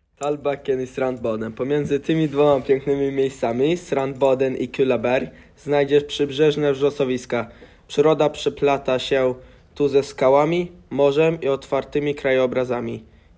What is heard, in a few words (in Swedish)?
polska; Tallbacken i Strandbaden